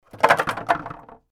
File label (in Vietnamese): Tieng tha do vat bang go